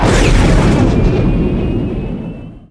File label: warp enter; Earth and Beyond; EA Games